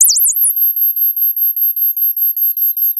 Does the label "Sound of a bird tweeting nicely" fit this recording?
Yes